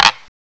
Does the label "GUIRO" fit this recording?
Yes